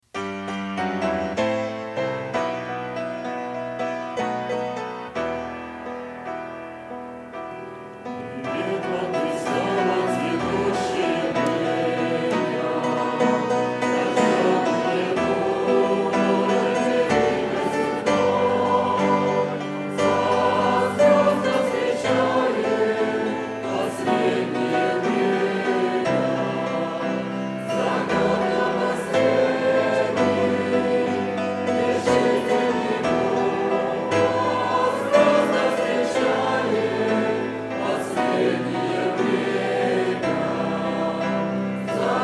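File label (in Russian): Общее пение; Privet vam Xristovo; Научи нас молиться - конференция в Калифорнии - Нояб 22, 2008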